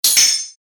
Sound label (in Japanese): スイッチ; モノラル; ＲＰＧゲームのダンジョンやフィールド